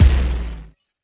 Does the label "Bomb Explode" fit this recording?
Yes